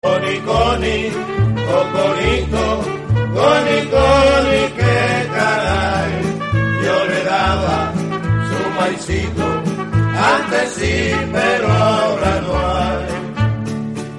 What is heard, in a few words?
cocorico ringtone